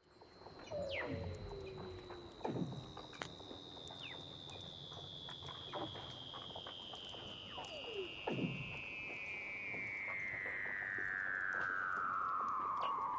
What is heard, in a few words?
Weddell Seal